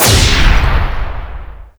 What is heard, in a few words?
laser slam; ExperimentalGear